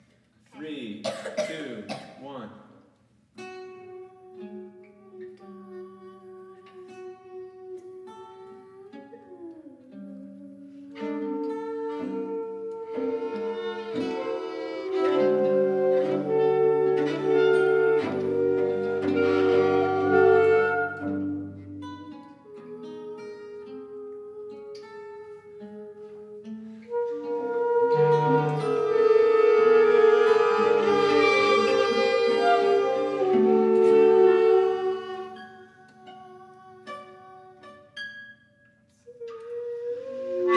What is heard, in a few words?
Improvisation